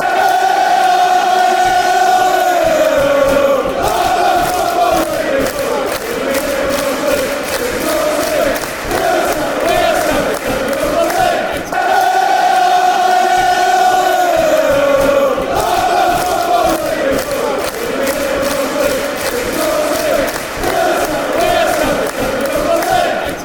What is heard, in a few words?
eieieio, fan chants; southend